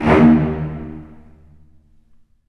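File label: Cello Spiccato D#1; Digital Sound Factory Virtuoso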